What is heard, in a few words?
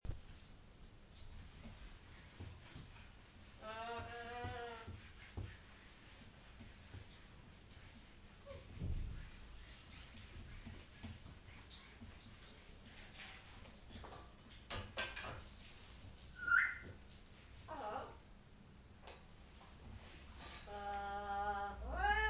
parrot singing